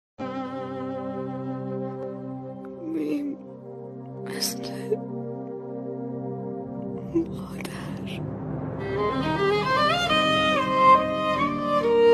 Violin; ویولن نوازی میم مثل مادر

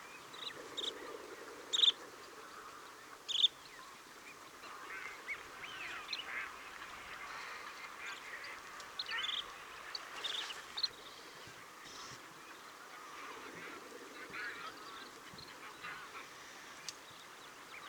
Curlew sandpiper; پرنده تلیله بلوطی

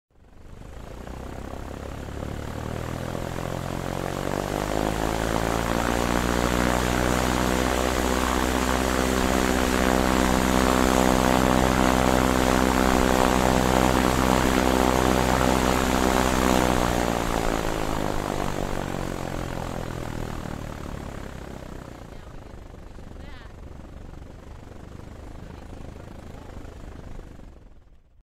Cessna plane; هواپیما سسنا

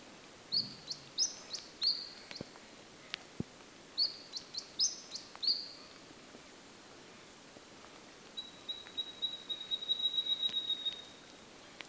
Eastern spinebill

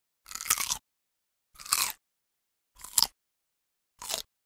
the sound of a bitten cucumber

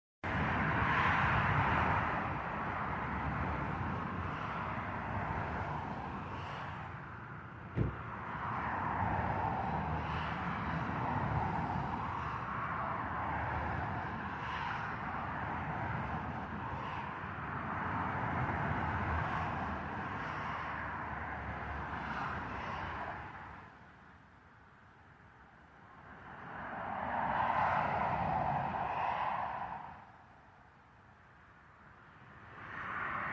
Quiet highway; اتوبان خلوت

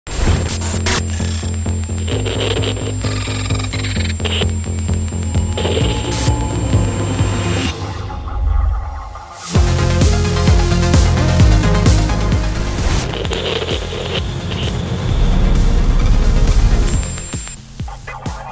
production Tool

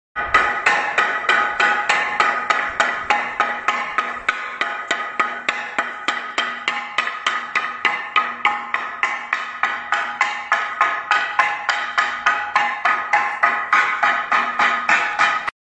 Semantron

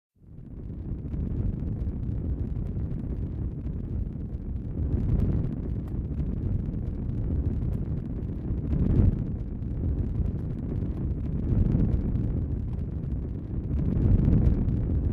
fire torch

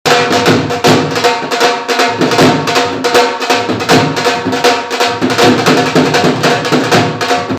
dhol beats

